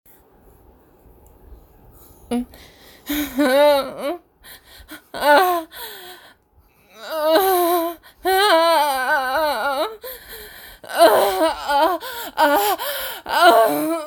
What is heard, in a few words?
Moan track real orgasms